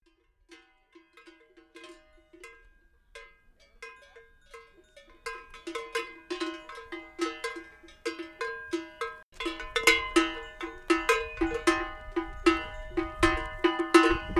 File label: Cowbells